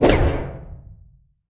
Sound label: ricochet